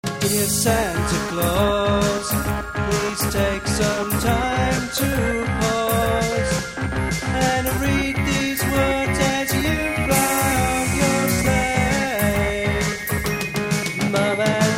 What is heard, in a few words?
Chorus